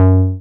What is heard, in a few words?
SYN TBM G3, MC202; TB303+MC202; Sound & Vision - Gigapack I CD 2